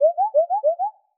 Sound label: Incorrect